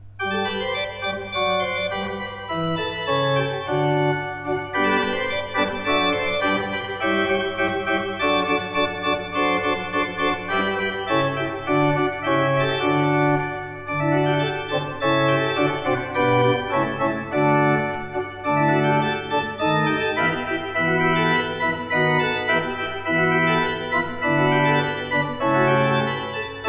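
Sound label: Positiv, organ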